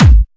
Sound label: Trance Kicks, Bassdrums